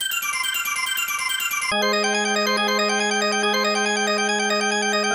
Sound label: arps